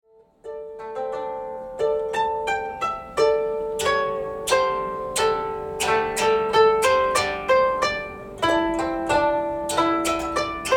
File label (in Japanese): kotouta warabeuta